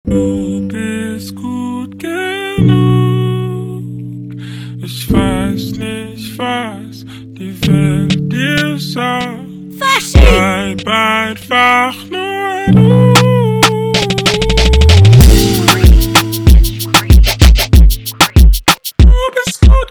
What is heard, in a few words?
Brega Funk